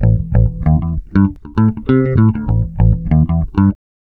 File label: LIGHT 98 A, Bass Grooves; USB Soundscan vol.30; AKAI